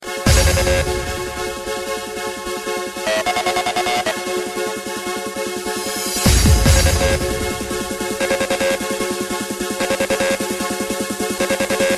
Best Remix Tone

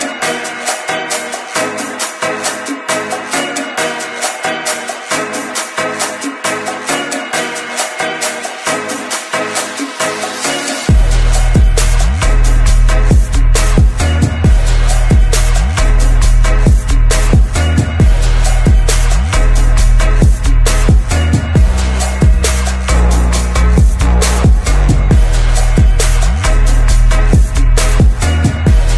Cuban

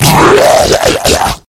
Melting Explosion

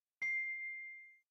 Bell; Transformice

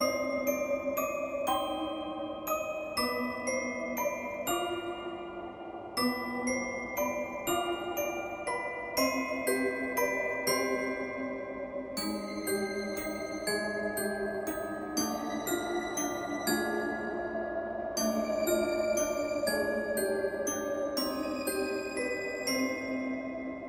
eerie music; ringtones

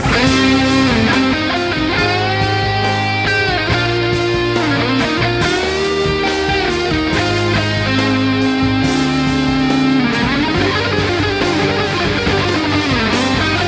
Guitar

